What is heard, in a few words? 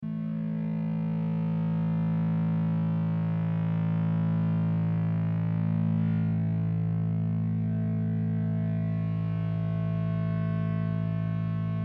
A Drone on Ebow